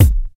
dance hall kick single hit A key, kick drum single shot